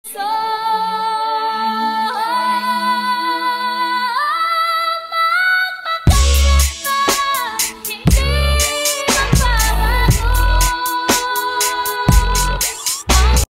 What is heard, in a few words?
remix